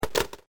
hangup

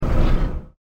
fan

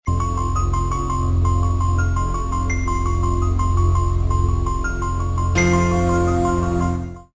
midnight piano

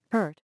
hurt

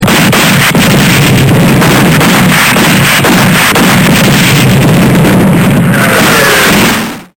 battle

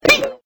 ping

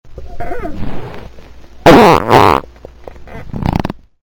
fart

